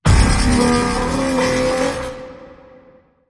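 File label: Creature Robot Groan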